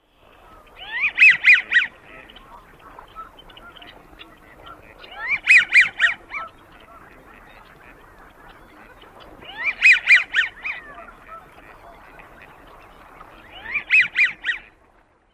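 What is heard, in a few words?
American Wigeon male calls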